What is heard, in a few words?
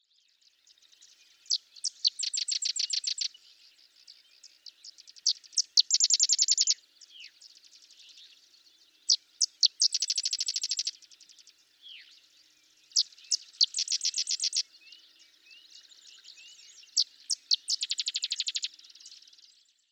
Sedge Wren